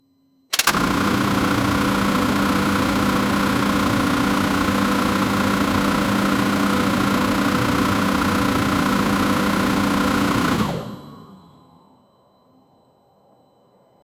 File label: initial start engine; machines